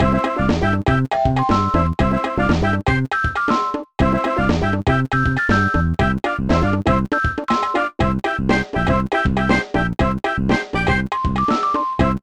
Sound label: Caribbean; Moshi Monsters Moshling Zoo; NDS